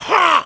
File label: Final Punch; Ganondorf punching Link; Zelda